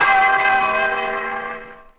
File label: Bells